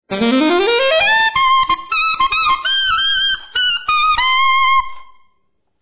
sovracuti fraseveloce, Sax

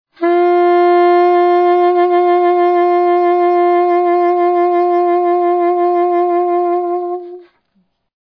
veloce, sax

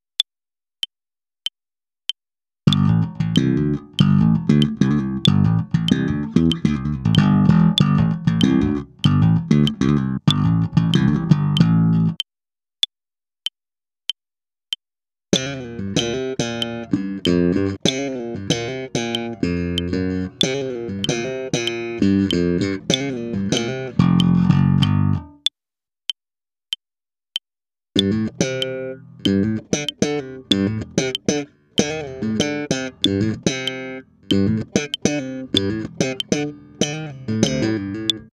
slap, BassoElettrico

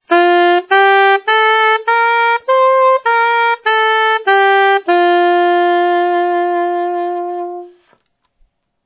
scala staccata, sax

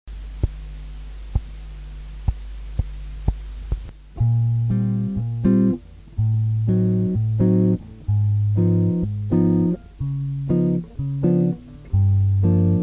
succinta accompagnamento

